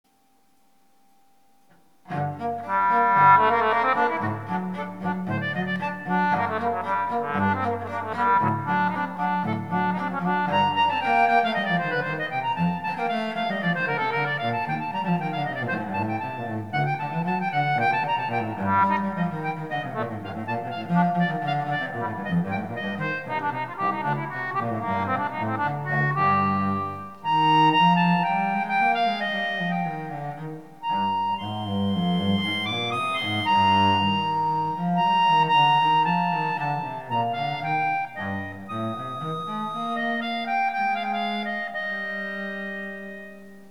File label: Duet; cello; Clarinet and Cello Duet in G minor; clarinet part played on concertina; Dodgy cello playing; by Ben Wassell; English concertina